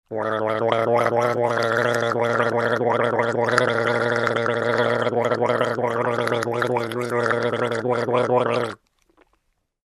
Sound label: Gurgling Water